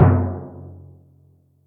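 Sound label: Timbale Timpani; Yamaha DTXpress; Effect 1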